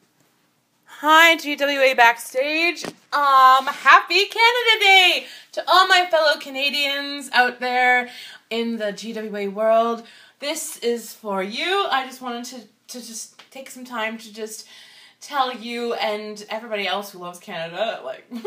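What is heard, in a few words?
[F] O Canada [singing][national anthem][loud and proud]; Happy Canada Day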